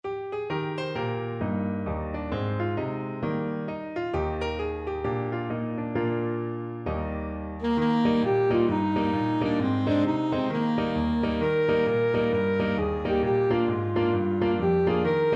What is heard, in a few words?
ASAX, saxophone